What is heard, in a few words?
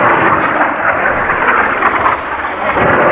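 projectile loop; Modern Warfare; javelin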